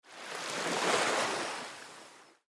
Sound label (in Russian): mjagkaja volna